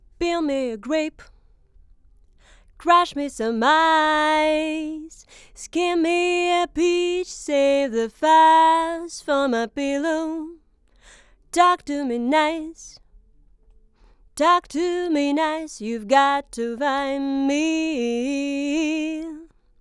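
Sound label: domra, Neumann TLM170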